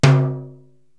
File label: Tom Jazz Hi